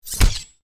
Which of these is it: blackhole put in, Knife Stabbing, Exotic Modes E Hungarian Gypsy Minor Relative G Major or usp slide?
Knife Stabbing